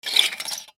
Metal Squeaks, Metal Squeaks Device; Foley; Objects; Blastwave

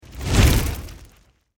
Fire Fireball Pass By Heavy Crackle Whoosh; Flames

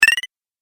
Game App Mini Boost, Video Game Sound Effects

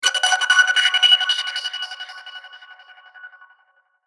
Sweeper Chopper Flange, Chopper Dark Ghostly; Chopper Sound Effects; Production Element Imaging Element Accent Transition

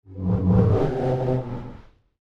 Whoosh A Bumpy Rumble; Whoosh Sound Effects

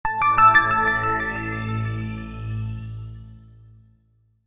Startup, Computer: Short Musical Style Phrase

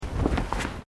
FOOTSTEPS TABI TATAMI MATS STEP; Footstep Foley; Footsteps (Sound Effects:Human)

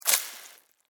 Spider Bite, Suck; Animal, Insect; Spiders (Sound Effects:Animals:Insects); BlastWave

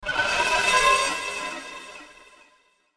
Logo Fuzzy Delayed Whoosh; Blastwave; Sonic Logos

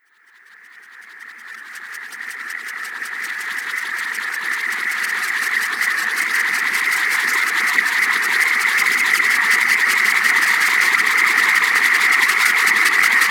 Fear Of The Dark Drone; Scary Soundscape Sound Effects; Horror Sound Effect

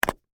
Plastic Case Open, Foley; Latch; BlastWave

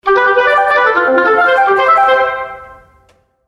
Flute Transition; Transition Sound Effects

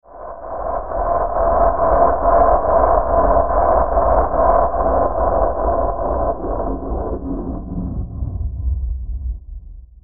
Eerie Pulse Swell Descend; Warped; Blastwave

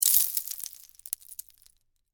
Debris Drop Penne, Rigate; Texture; Materials; BlastWave

